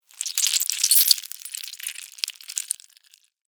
Spider Bite Chew, Animal, Spider, Bite, Chew, Eat, Juicy, Wet, Arachnid, Insect, Bug; BlastWave